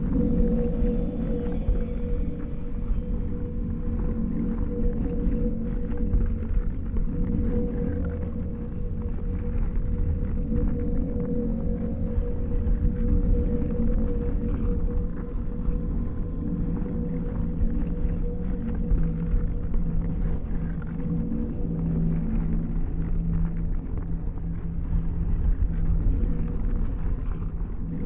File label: amb outpost station; necroid; Stellaris; necroids